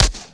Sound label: SHOT; Oberheim drum sound chips